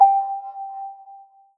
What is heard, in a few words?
count go; MarbleMarcher